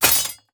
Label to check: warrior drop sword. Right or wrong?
Right